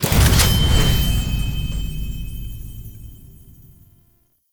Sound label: Weapon Select Confirm, UI; Warframe; Awakening Remaster